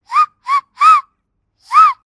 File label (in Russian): zvuk svista